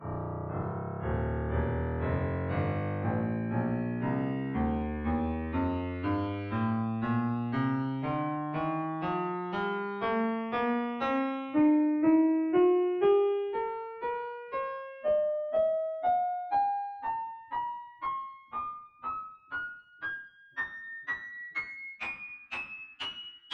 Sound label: scale spectmorph